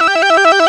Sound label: TRILL, Korg; Back In Time Records; Korg Universe VOL-1; FX FAMILY